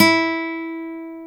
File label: NYLON E 4, Acoustic Guitars; AKAI S6000 CD-ROM - Ultimate